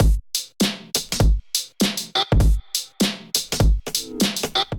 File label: Groove 100 Bpm, Drums; MIDI Clips; Jiggy; Ableton; Factory Packs; Chop and Swing